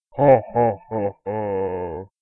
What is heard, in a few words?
Evil Laugh; haunted sounds